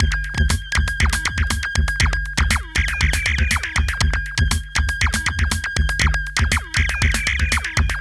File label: Structured Rhythm, IDM Rhythms